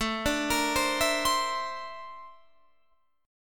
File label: A sharp Major Sharp 11th, A